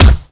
bouncy snowman hit; bouncy objects; Tony Hawk's Project 8; XBOX

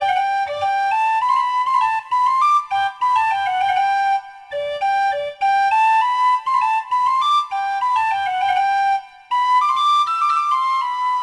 township wright; Sid Meiers Pirates; XBOX

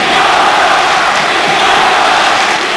Chant, Crowd; David Beckham Soccer; XBOX

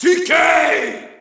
Shout, audience; UFC Tapout 2

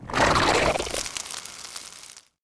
meat Super Hit; weapons

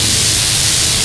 Cosh Charge Loop, Electric Cosh

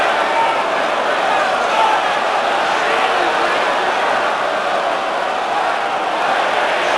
Medium Crowd Unrest; David Beckham Soccer; XBOX

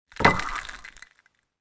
glass slide

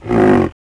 Call, Dino; Anky

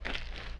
TIRE STEP; Cars; XBOX

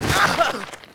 FALL, Rider

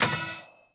ACCEPT, UI; Cars; XBOX

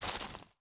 FS Weeds, footsteps

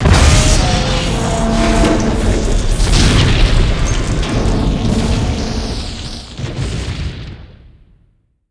die; Star Wars Clone Wars; XBOX

